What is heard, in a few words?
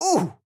AHurt